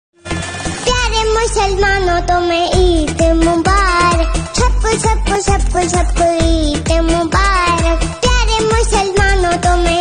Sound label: eid mubarak ringtone music